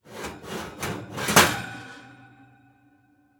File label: Household Oven Shelf Movements